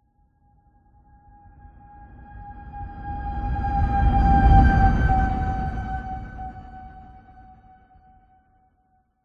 Passby Designed Whoosh Futuristic Scifi Airy Synth Spaceship Slow; PMSFX